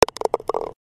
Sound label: Golf slide close; Nokia Ringtones; Audio themes